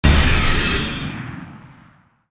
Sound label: explosion